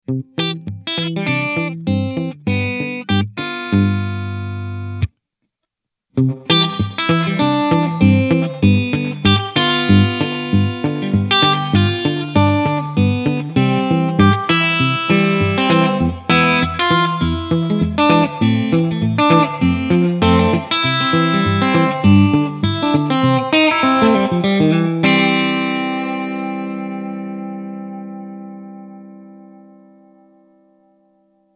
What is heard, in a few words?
Spring Overdriven, reverb; Noon